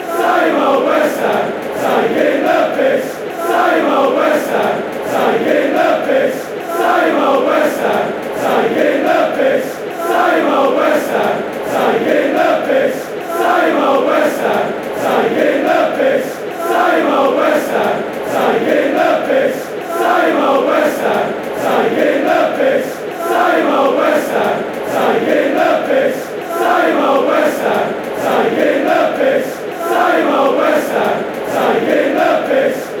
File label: same old west ham fanchants